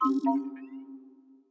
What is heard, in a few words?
Ui Notification oxygen water power